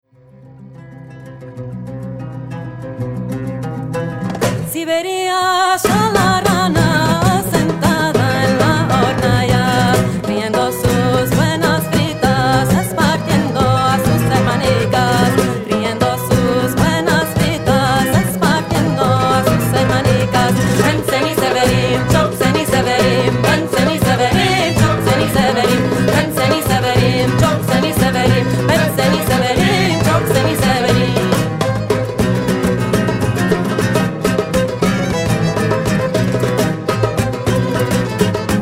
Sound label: turkish sephardic